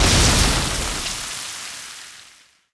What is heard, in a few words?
acid bombs hit